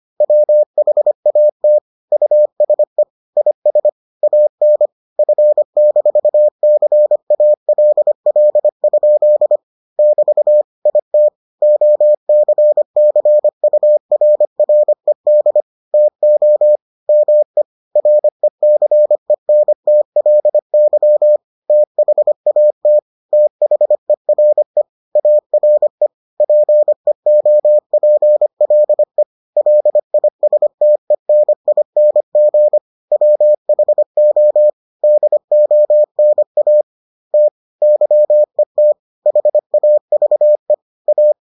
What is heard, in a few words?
morse